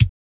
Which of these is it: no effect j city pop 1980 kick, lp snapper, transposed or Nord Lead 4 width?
no effect j city pop 1980 kick